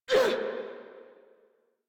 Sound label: Pain Grineer Female, Barks, Battle; Warframe